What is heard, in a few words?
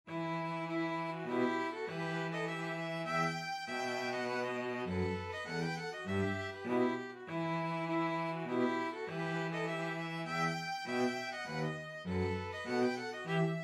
Starkens Polska, 2 violins cello